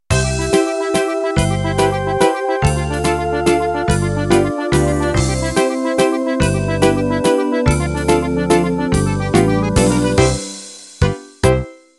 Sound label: Musette End